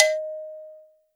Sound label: Cow; Roland R-70; Perc